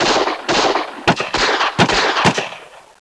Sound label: gunshots seven